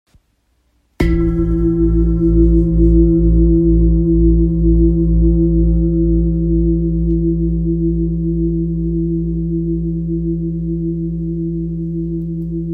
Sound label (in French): Bol grave fleur de vie la diese 8,21kg